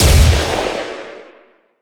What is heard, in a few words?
Sci-Fi Weapons-Sniper Rifle Single Shot